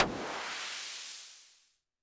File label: percussion, Roland TD-20